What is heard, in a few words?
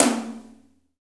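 tom, acoustic toms; Roland - Rhythm Section; Real Toms 1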